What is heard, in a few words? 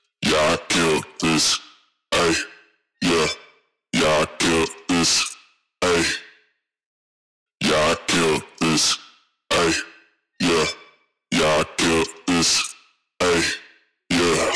130 BPM C Min Vocal; Melodies; Wonky Trap; Loop Stems; Cymatics; Killed This